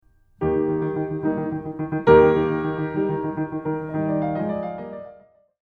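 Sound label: beginning piano; op-7-part-one; Beethoven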